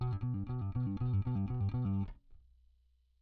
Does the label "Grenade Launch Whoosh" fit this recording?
No